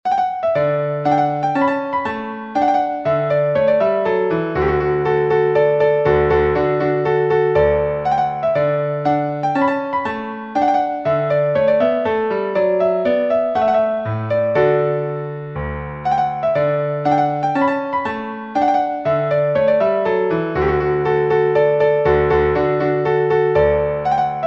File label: trumpet tune